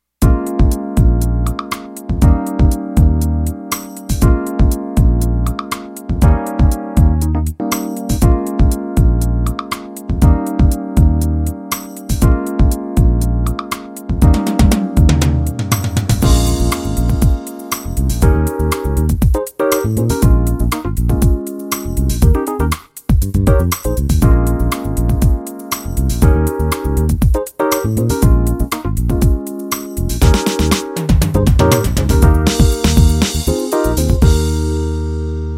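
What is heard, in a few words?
8 beat analog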